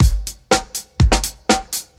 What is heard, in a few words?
121 bpm drum loop A key, kick tuned to the A note